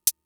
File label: closed hats, Fricke MFB-522